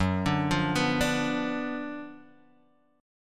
Gb Major 7th Suspended 4th Sharp 5th Gb, Guitar Half Step Down